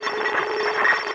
Multiple two-way radio communications with interference.; Two-Way Radio Telemetry, Radio Static